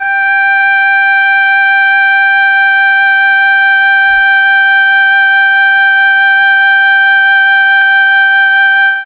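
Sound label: G3 oboe, chamberlin; CHMBLN OBOE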